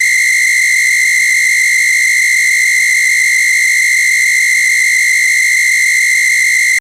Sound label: S Whist H, Percussion